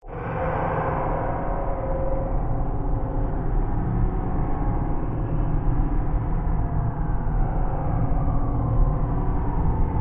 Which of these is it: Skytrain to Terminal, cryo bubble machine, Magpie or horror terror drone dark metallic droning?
horror terror drone dark metallic droning